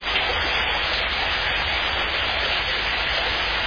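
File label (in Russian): водопад близкий бурный приглушенный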